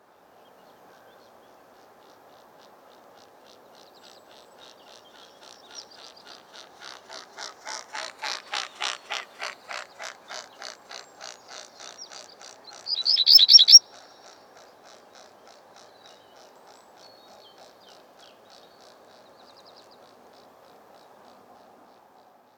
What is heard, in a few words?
Mute swan; قوی گنگ